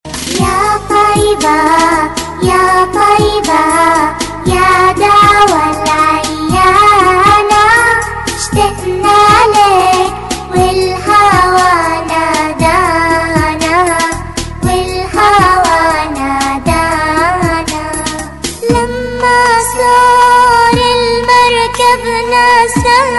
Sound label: islamic tune ringtone